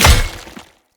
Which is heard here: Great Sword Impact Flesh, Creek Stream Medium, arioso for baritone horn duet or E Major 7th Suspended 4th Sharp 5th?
Great Sword Impact Flesh